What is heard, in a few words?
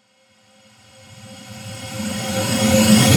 Riser